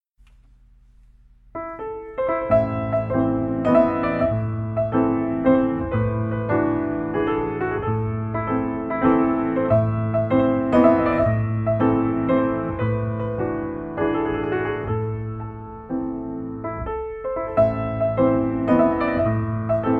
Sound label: Mazurka in a minor